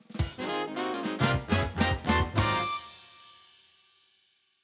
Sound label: Survival Bowling - Round Start (Jingle); Nintendo Switch Sports